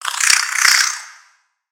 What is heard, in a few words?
Spider Angry, creatures mechanical; Lego